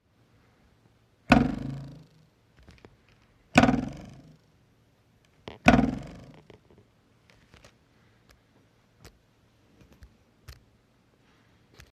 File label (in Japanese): ぶるるーん